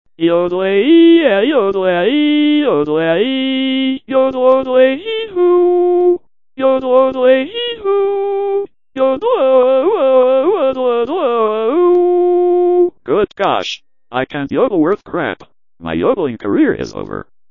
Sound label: yodle